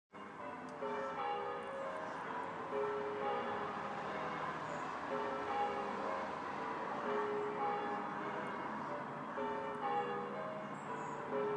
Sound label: church bells